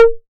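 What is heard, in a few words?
808 high conga, Tape Sat; Tape808; Goldbaby Tape808 & Tape909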